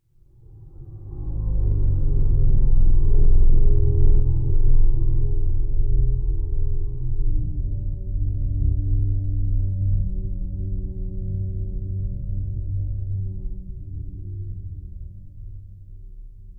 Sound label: blurp, underwater